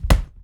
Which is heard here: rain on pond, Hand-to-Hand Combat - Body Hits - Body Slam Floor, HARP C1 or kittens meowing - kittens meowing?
Hand-to-Hand Combat - Body Hits - Body Slam Floor